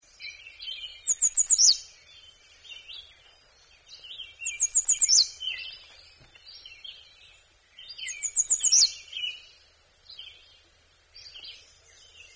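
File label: American Redstart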